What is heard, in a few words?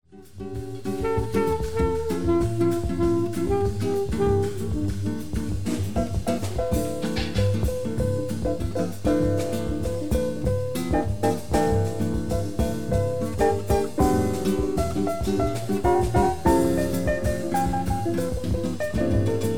samba